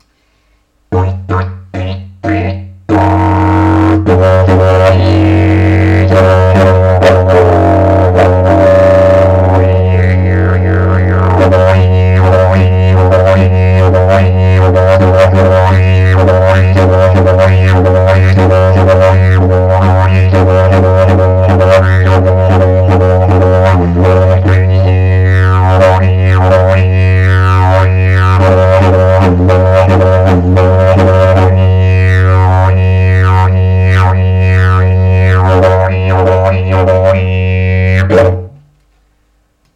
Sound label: Didje Agave